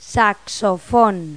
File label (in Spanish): SAXOFON; Pipo-Aprende musica con Pipo